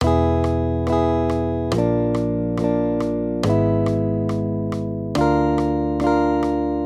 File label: maj triads; E-Bb; accompaniment